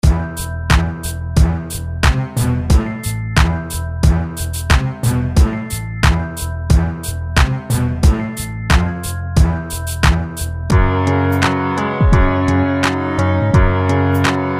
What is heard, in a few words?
Hip Hop Loops